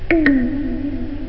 wall

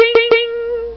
BING BING, beeps

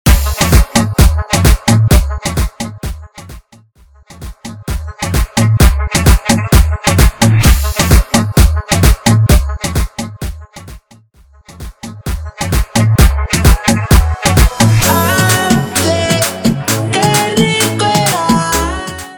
Remix Intro Outro Clean 130 BPM Guaracha Raico

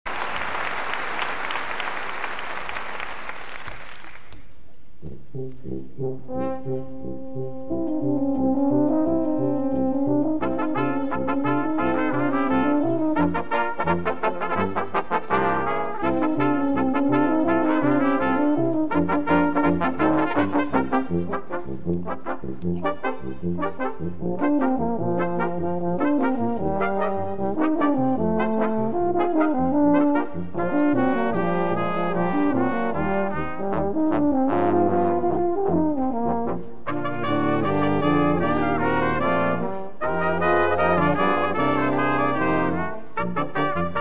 Brass Jingle Bells; Collection: Christmas Show 1989; Genre: Traditional Standards; Type: Christmas Show

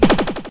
ray blast; tech

